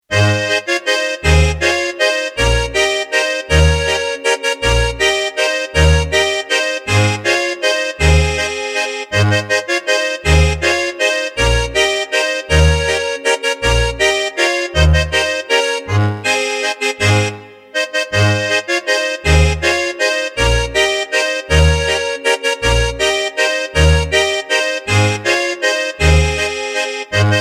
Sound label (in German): Walzer